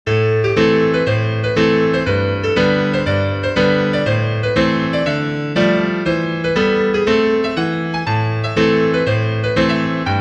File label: piano